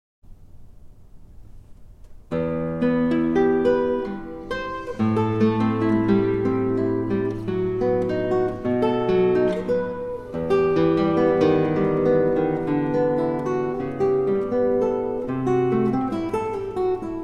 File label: South American Piece, Guitar; Jose Merlin; Evocation